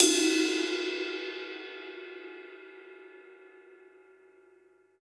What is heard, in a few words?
Ride Neutral; Drums